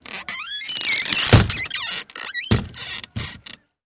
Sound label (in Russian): zvuk rozpashnyh dverei v bare